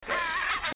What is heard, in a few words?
Strange Sound; VC GhostHunt 2008; EVPs; Camera EVPs